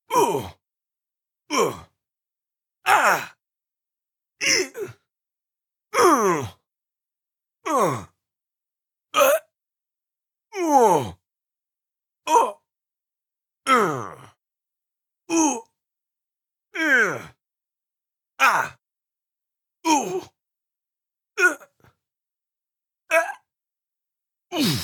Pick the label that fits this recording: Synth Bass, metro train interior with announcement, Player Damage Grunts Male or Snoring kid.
Player Damage Grunts Male